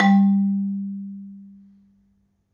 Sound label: Marimba, G1; Terkelsen